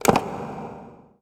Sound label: menu click